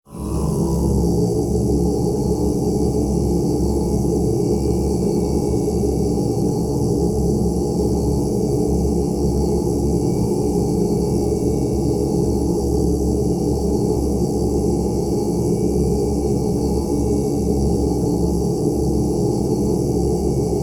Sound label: MONKS IN MEDITATION